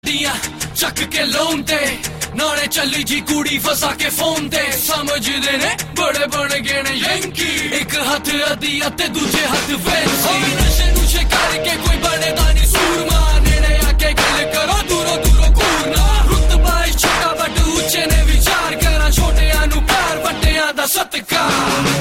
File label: best rap